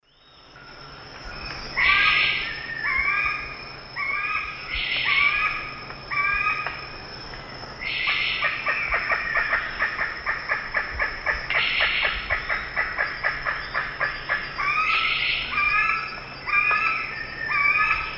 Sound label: Pteroglossus azara, calls; S. America; Eastern Lowlands